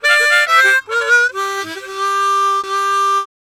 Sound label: Harm 129bpm G, Harmonica